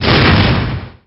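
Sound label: Se Magic Fireball; Etrian Odyssey I HD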